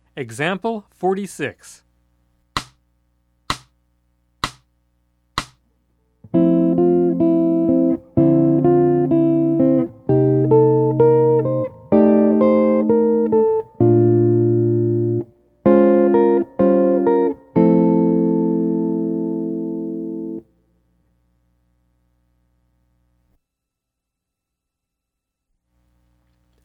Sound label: Jazz; jue shi ji ta